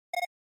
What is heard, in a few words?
NES RPG Message